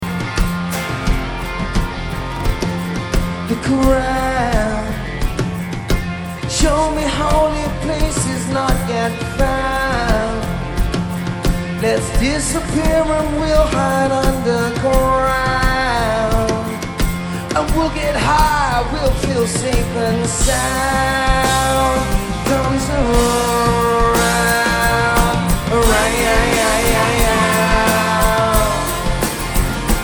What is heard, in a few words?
Soul Singing